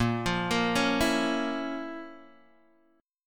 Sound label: F Major 7th Suspended 4th Sharp 5th Bb